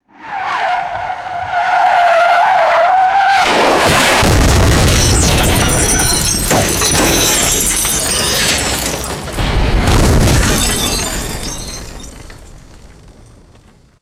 car tire skid and metallic crash; destruction

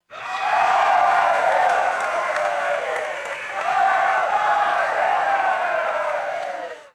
distant crowd of men cheering and clapping

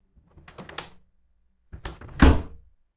wooden closet door closing and latching

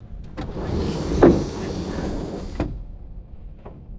sliding train window pulled open; trains

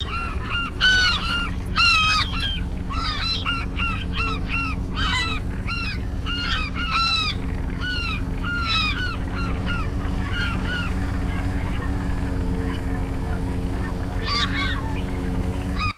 seagull calls with distant boat beacon; birds

